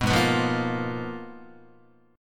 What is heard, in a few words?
Ab Minor 6th Add 9th, Ab, Guitar; strummed; JGuitar